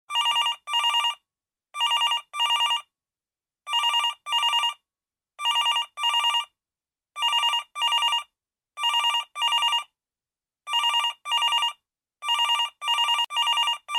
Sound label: modern phone; ringtones